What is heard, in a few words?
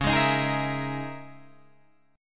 C sharp Minor 6th Add 9th, Guitar, Drop D; 11,11,13,13,11,12